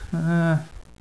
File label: nervous, hostage reactions